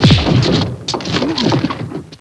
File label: PUNCH